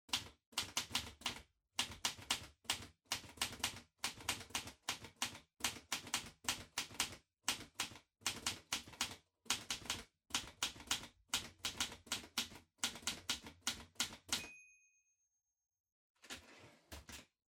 Typewriter Far Type

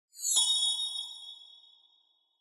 Bell Accent, Dry

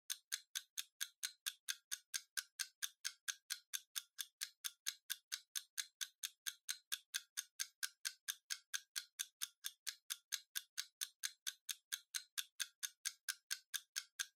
Kitchen Timer Tick Long

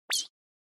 Surprise High; Onoma

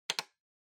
Time Recorder Button

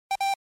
Arcade Shooter Score

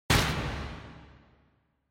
Motion Slam Reverb

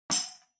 Tambourine Mute

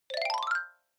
Xylophone Fast Long 3 Up

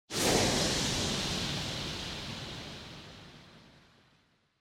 Motion Speed Lines Long

